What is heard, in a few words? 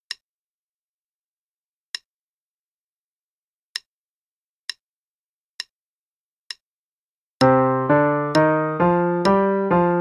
c minor Pattern (qn=66); CM Level Prep; Lesson Books